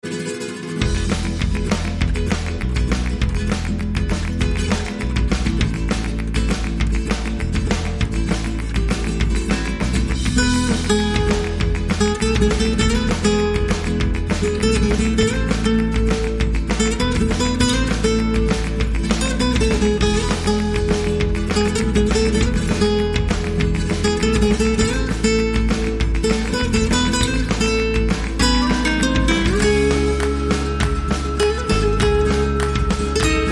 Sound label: Indie Flamenco